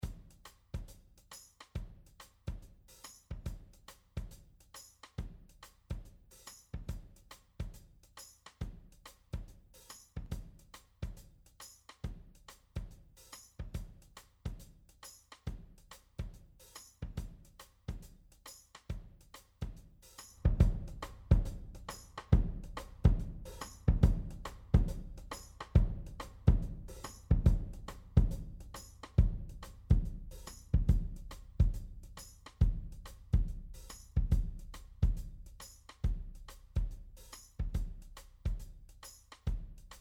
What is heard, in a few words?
Elysia Low shelf